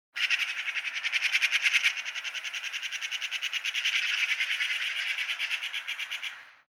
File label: birds chirping in the jungle; افکت صوتی